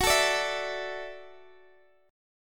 F sharp Minor 7th Sharp 5th F sharp, Guitar Open D